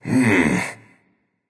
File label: thinking; smite; xingtian